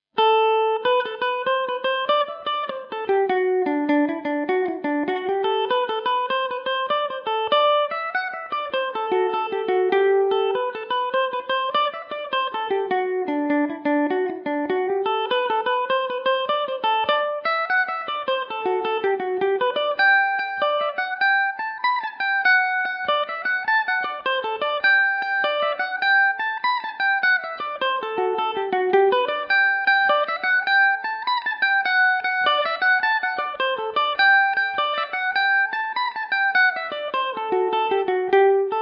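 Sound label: high part of the road G major revelation, mandolin; Revelation Jaguar electric mandolin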